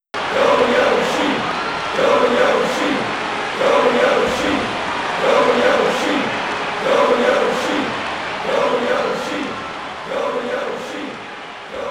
Crowd Chant; mario strikers charged football wii gamerip 2007; Yoshi

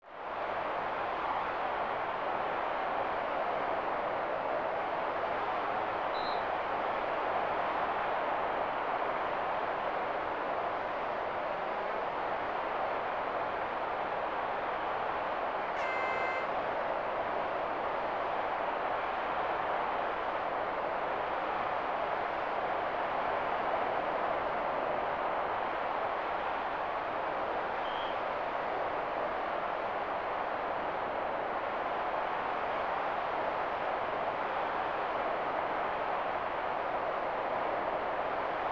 Crowd; WWF Raw; SNES

